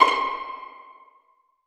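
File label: string pizzicato C5 mezzo forte right; Sound On Sound - Peter Siedleczek's Orchestra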